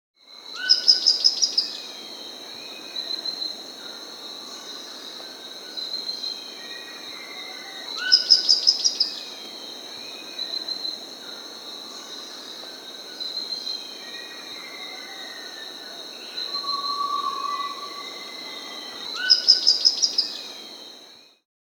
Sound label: Rufous-Rumped Foliage-Gleaner (Philydor Erythrocercus Achrogaster), Andean Birds; S. America